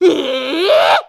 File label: Gasp; Ravio gasping; zelda